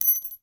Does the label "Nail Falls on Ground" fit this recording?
Yes